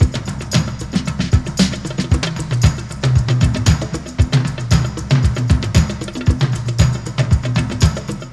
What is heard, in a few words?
Bongo Band